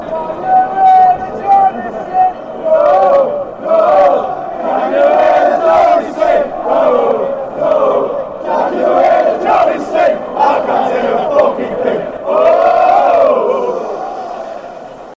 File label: can you hear geordies sing, fanchants